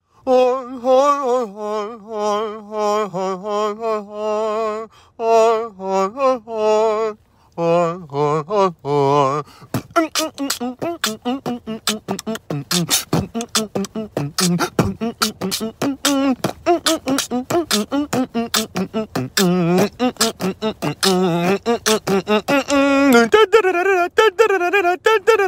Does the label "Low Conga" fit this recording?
No